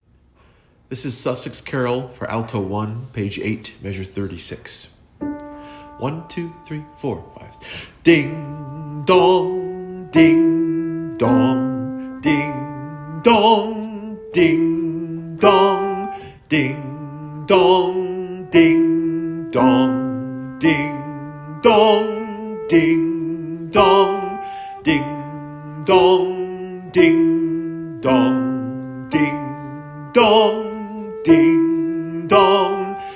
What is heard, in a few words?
alto, adult choir; Sussex